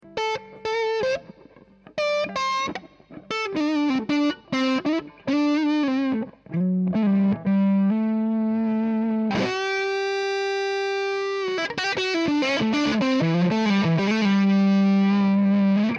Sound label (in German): Hals PU verzerrt